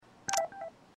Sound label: new sms; life is strange